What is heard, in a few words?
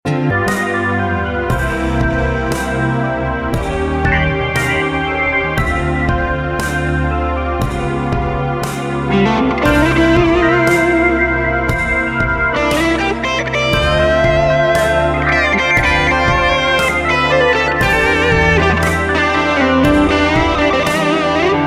Solo